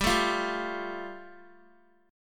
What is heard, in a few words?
G 7th Suspended 2nd, Guitar; G7sus2 strummed